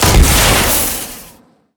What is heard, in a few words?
rocket launch